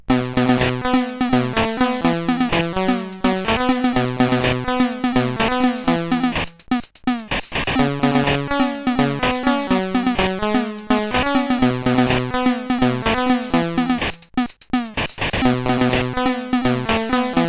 Fallen Angel, CSG8580R5

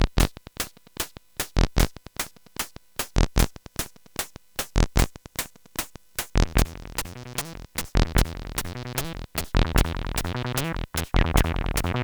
C64 303 Emulator, CSG8580R5

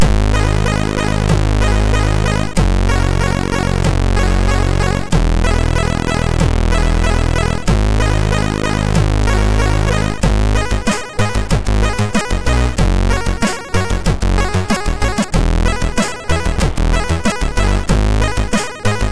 Intro, MOS6581R2; zax

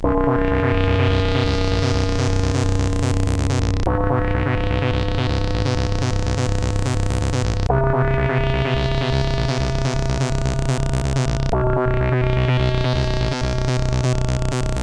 Brain Waves, CSG8580R5

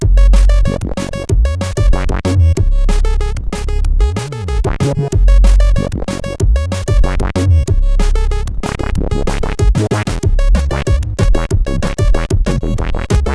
Bubble Wobble Intro, CSG8580R5

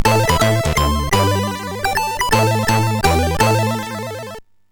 Jingles, MOS6581R4 sid

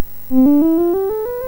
Simple Scale, MOS6581R4

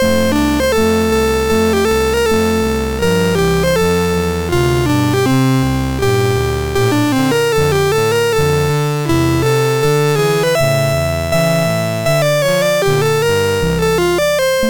sid, MOS6581R3

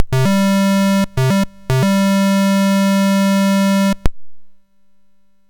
sid MOS6581R2; Nine Princes in Amber

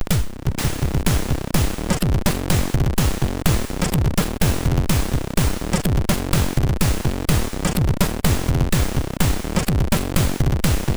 Joke 2, MOS6581

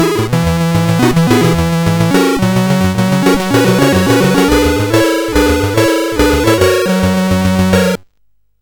sid CSG8580R5, GAMES; Continental Circus

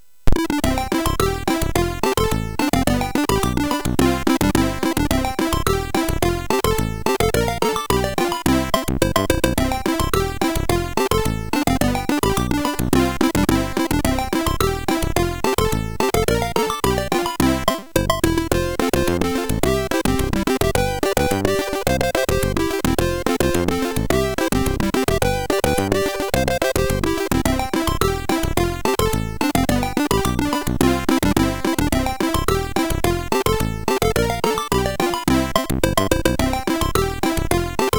game music, sid MOS6581R3; hvsc

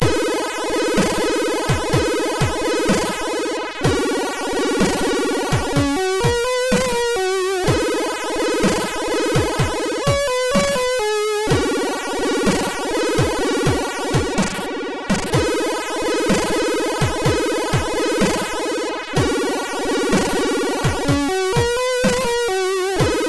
Pre and Get Groove, MOS6581R3

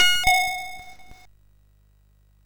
SFX, MOS6581; Narco Police

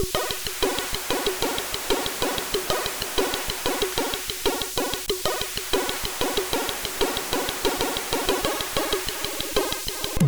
Bassliner, MOS6581R4

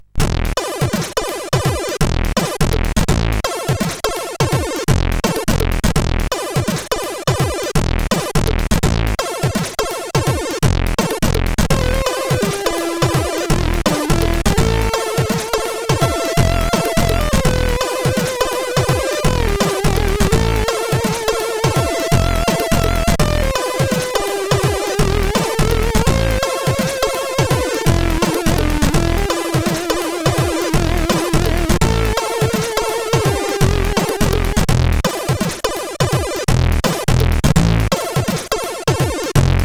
Holiday Funk, sid CSG8580R5

